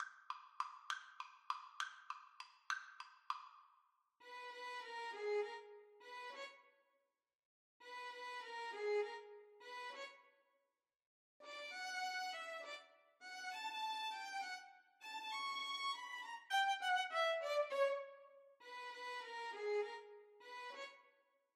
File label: marionette violin; Gounod, Charles; Alfred Hitchcock Presents